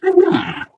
ballbot hello

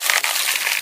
cardboard box strain; physics; Source Engine; cstrike

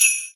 xmas sleigh bells

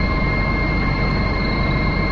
turbine, ambient; Source Engine; tf

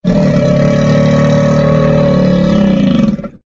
crocs growl; Source Engine; tf; lair

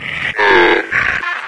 zombine alert; npc; source engine; episodic

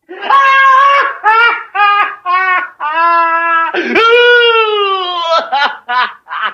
crazy, halloween; Source Engine; tf

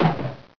g bounce, weapons; source engine; hl1

